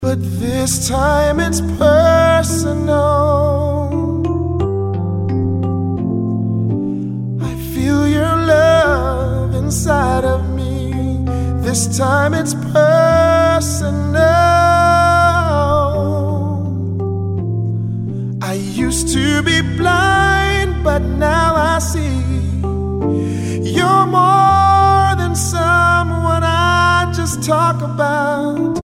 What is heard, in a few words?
Personal ring tone